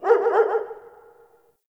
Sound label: Distant Ambient Dog Bark; Warframe; Ambience; Nineteen Ninety Nine